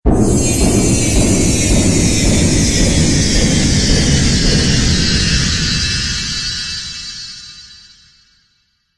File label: Magic Explosion Delay